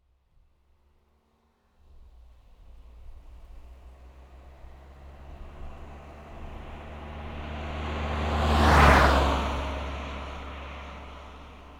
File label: Audi A3 8L 1.9 TDI exterior passby medium speed constant rpm; stereo; Soundholder